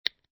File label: click